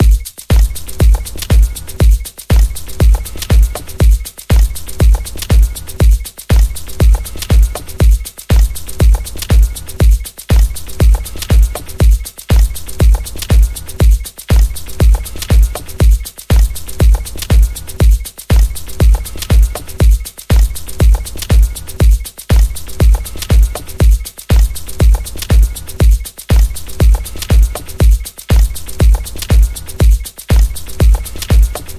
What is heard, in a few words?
woodlands organic house; pml woodlands vol 2; sample pack